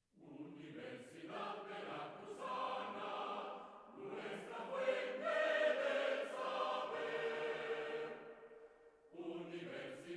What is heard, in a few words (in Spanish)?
Himno UV